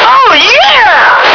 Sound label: heal; Monster Hunt 2 Lite